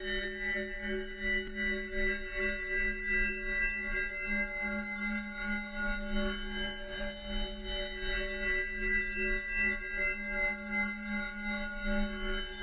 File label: lobby victory; smite; atlas